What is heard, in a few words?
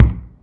foot wood, footsteps; midnight guns